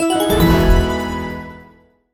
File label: Win General; netent